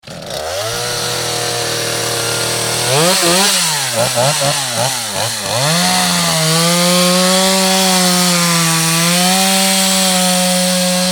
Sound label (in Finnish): moottorisaha